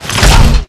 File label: Stinger Deploy; Requests Studio; UT3